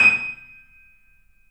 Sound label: The Piano, D#; InVision Interactive - The Piano